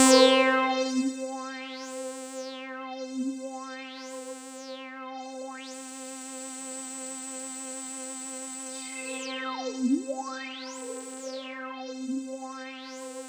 C4, Roland JV 2080, JUNGLE BRASS

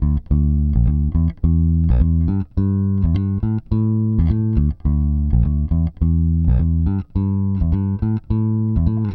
Pop, Bass

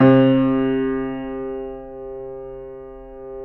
Keyboards Acoustic, C2; USB Soundscan vol.17; AKAI

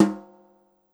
D3 Brush Tom 6, Yamaha PSR-E323 Brush Kit

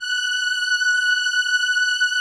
VP-330, String Machines

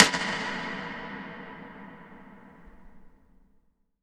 IMP SNR DRUM, Drum, Cinematic Impact; M-Audio Pro Sessions VOL-25; Liquid Cinema

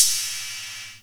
Syn Crash, Zoom RT-234; 300 Drum Machines; Kit C

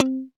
GTR Funky Strat; 90's Sample CDs; Club-50 - Foundations Roland; Funk & Mute

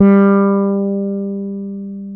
FRETLESS G3; InVision Interactive; Lightware VOL-1; Instruments & Percussions

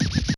SCRTCH, SY99; Crypton - DrumBase5000

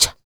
FEM PERC, Vocal Jazz FX

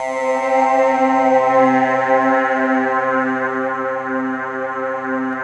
Microwave; Optical Media International; Sonic Images Library; Wavetable

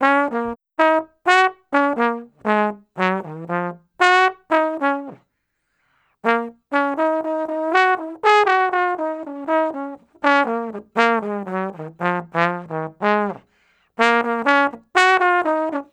Trombone Solo, Jazz; Sonic Foundry (Sony Creative Software); Jazz Solos and Sections

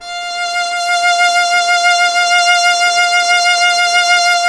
violin, Solo Instruments; Miroslav Vitous; Solo Instruments VOL-2; Solo lnst. II

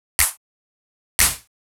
Clap, EDM; Sonic Foundry (Sony Creative Software); Afterhours EDM; Retro Active

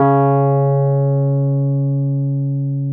Wurlitz EP C#; Sonic Reality - Vintage Timetraveler

